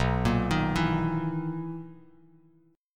B Major 11th B Bass Standard; BM11; arpeggiated; JGuitar

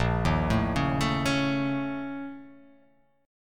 A sharp Minor Major 7th Double Flat 5th A sharp

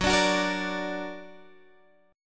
F sharp Augmented, down; strummed

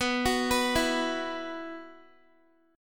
B Suspended 4th, Guitar; arpeggiated

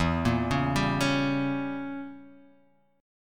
E Diminished 7th E, Guitar Half Step Down

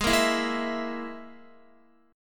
Ab Suspended 2nd Sharp 5th Ab down Guitar; strummed; JGuitar

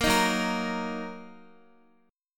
Gb 6th, Guitar; Gb6 strummed

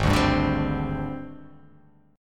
G sharp Major 7th Flat 5th; 4,5,5,7,7,7,x,x

